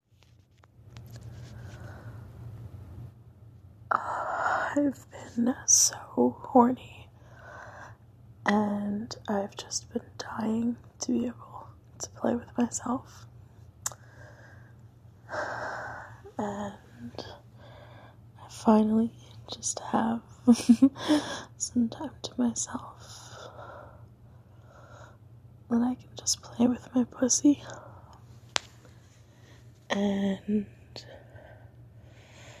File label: Soft Moans, wet noises; F4M; Soft Moans & Dirty Thoughts